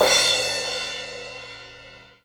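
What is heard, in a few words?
Crash Hardest, cymbal; hydrogen drum samples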